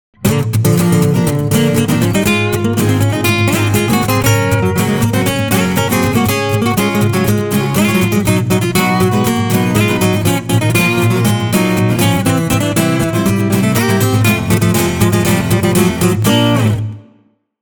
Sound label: Acoustic